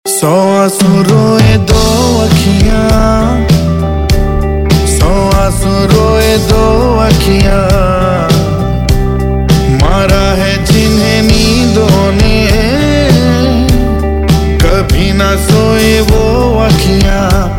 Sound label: Male Ringtone; Bollywood; Katti Batti